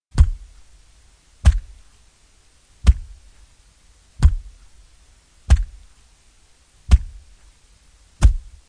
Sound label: axe chopping tree